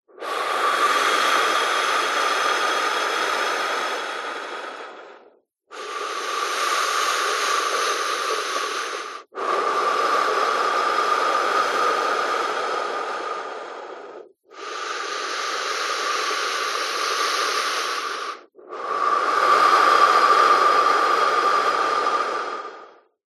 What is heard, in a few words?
human blow breathe in several slow